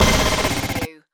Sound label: Hit Glitch